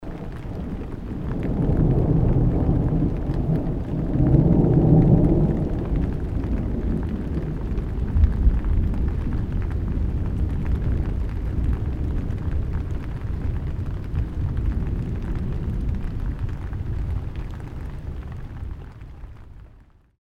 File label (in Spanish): Resonancia